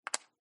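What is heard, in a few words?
checker place, backgammon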